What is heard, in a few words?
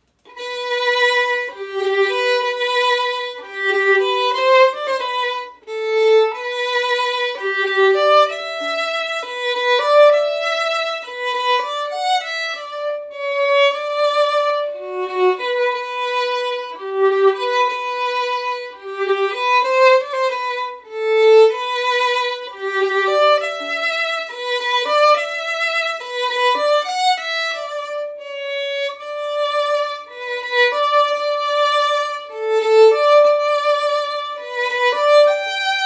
Waltz Brahms; Waltz Fast